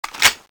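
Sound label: quad barrel clip in, weapons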